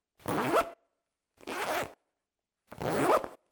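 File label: zipper fastener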